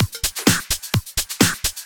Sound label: Clubhouse Loop 128 BPM